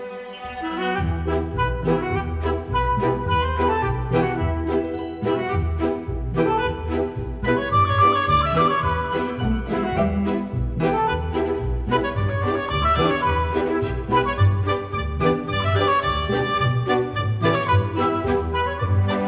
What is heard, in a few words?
Orchestra; London Philharmonic; Angry Birds